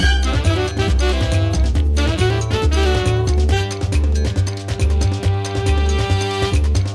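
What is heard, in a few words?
food world music paradise shooter no guitar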